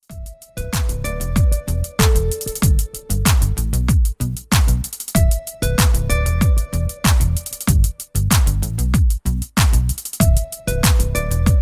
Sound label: fine music ringtone